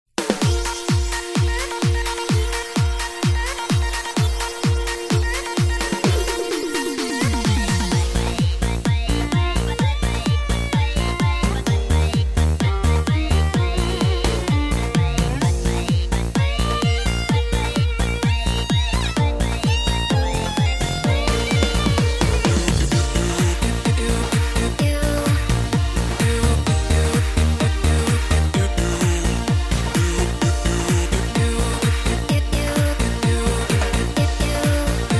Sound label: music